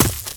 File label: gravel, footsteps infected walk; player